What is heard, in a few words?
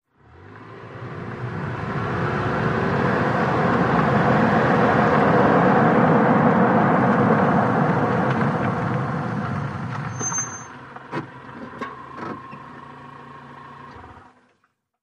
Sm Car Eng Accele, compact